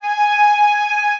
flute

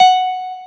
piano

